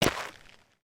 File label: Foot Dirt Sneaker Walk, footsteps